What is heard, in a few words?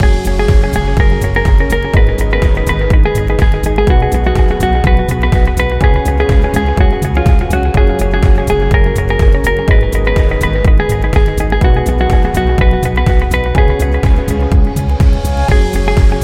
A Corporate Loop; explainer